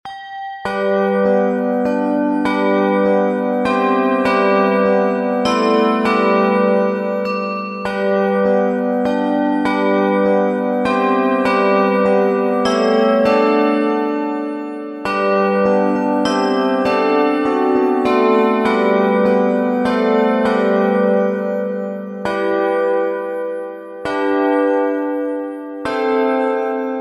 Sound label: dulci jubilo for handbells